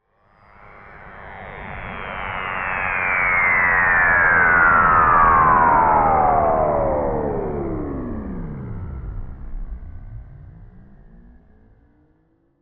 Cosmic Waves, Scifi, Cosmic, Waves, Science, Fiction; BlastWave